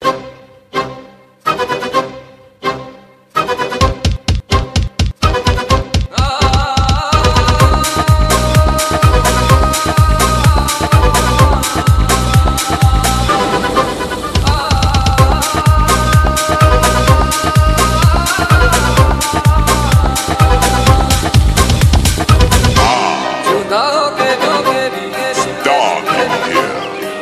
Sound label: Rock